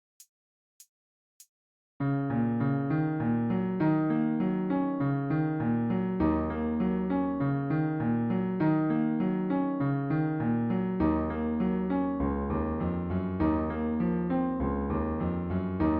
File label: Instrumental; Pony Music Archive